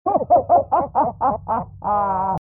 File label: Vox Filtered Evil laugh; The Binding of Isaac; Mom